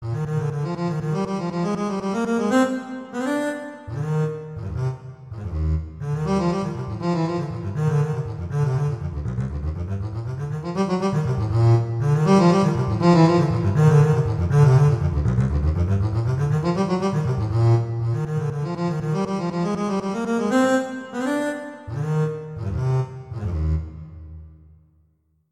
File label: Waltz; Dragonetti; Waltzes